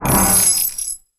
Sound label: collect